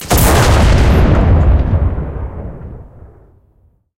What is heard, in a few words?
cannon 115mm u5ts shot, cannons tanks; War Thunder